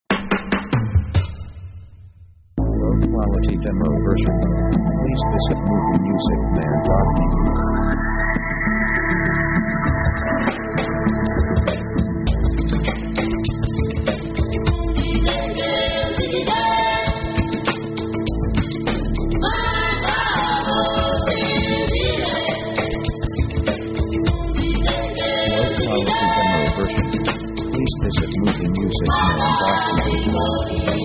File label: african setting; world themes